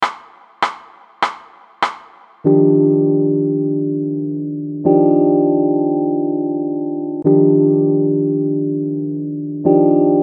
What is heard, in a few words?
chord